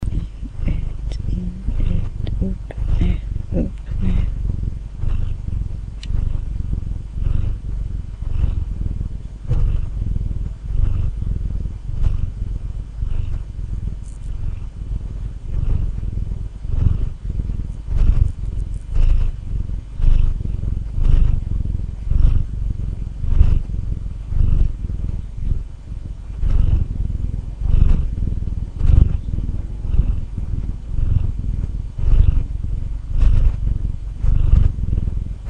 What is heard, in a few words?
purring domestic cat turbo; 16bit 44Hz